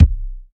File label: steel kick drum D# key, Royality free kick single shot tuned to the D# note. Loudest frequency: 134Hz; D sharp key; one shot